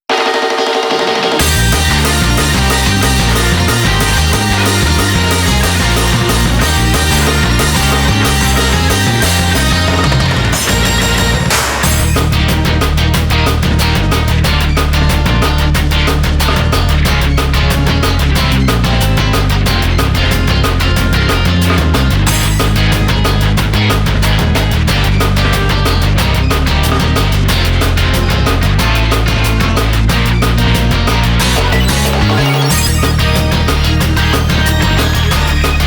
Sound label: instrumental